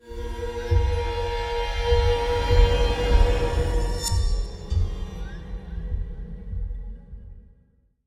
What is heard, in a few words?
Stinger Medium; Sniper Ghost Warrior 3 2017